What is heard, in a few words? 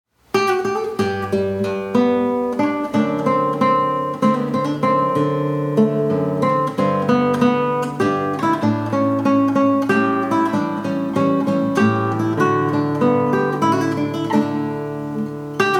Minuet from String Quartet; Guitar